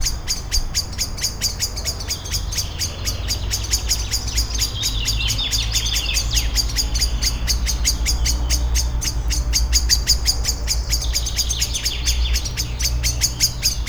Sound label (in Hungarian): kitartóan, fekete rigó; szegedi zoo 2011